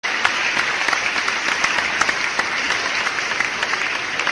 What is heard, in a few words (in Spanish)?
aplausossss